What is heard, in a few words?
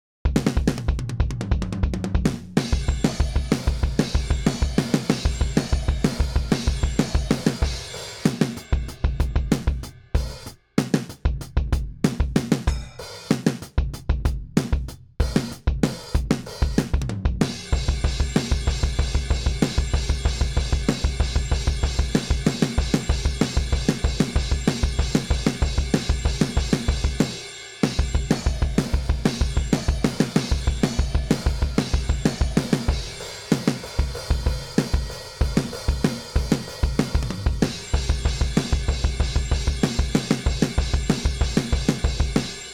Pro Punk Drums Sound Sample